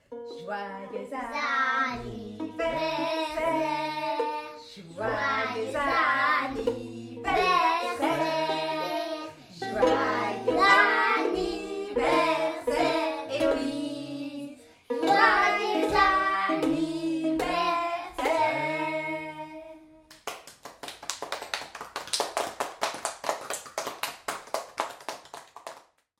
annif

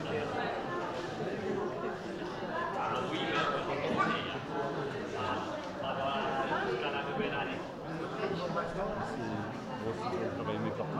concert ecole

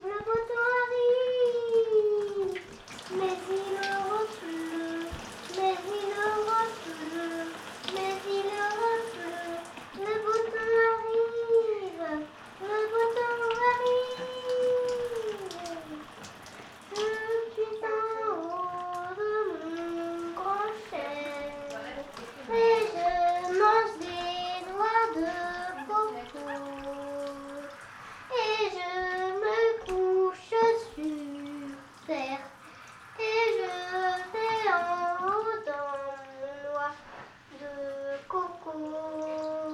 douche

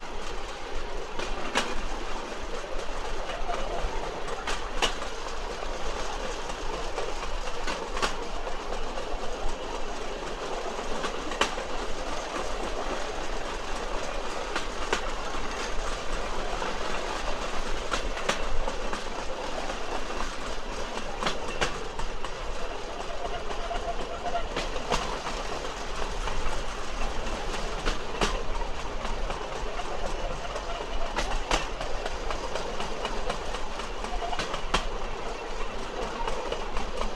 velorail

